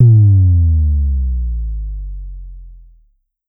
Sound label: Pollard Syndrum Sub